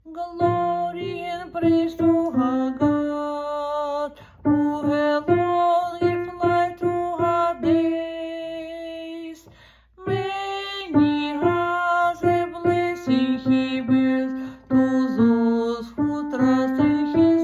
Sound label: Glory and Praise ALTO